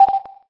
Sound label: Camera Self